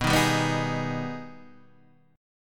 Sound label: Minor Major 7th; Gsharp; B; 7,6,5,4,4,4